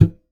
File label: Classical Guitar Extended Technique